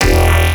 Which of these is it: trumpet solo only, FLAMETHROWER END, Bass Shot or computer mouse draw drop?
Bass Shot